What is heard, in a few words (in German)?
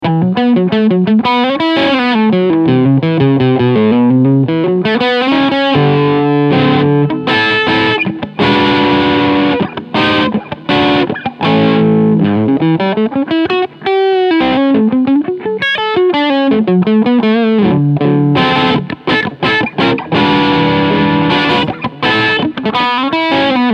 Maybach Albatroz 65 Crunchsound Volumeregler HALB